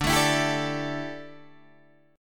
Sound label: D Suspended 2nd Suspended 4th D down Guitar Open D